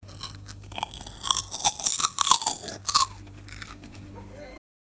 biscuit khane ka sound

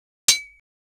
lathi se marne ki aaoj, close combat

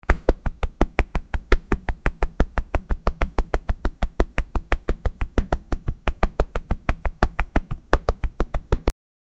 aadmi ka dodna, activity

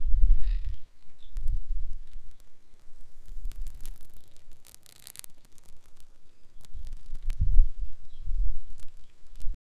banana tree se torni ki

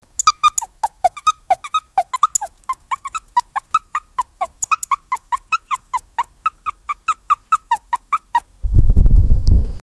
chhote billi ke bacche ko, violence

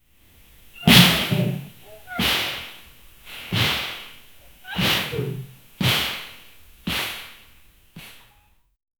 pieetne wala sound

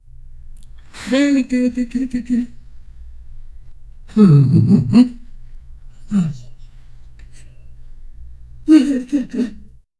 aadmi ka rona, sad

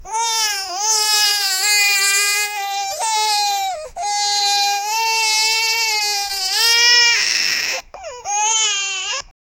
baccha rone ki awaaz

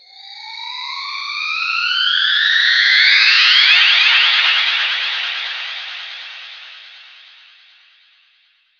hansne wala effect; human

